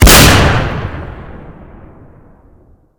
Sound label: golden deagle; weapons